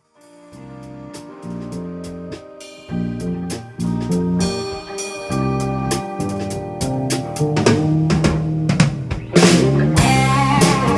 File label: groove